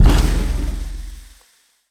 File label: scrap Cannon Shot; WEAPON; Mechanic